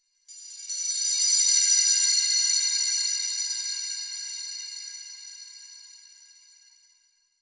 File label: High Baoding; Warframe; Ambience; Clan Dojo; Dojo Randoms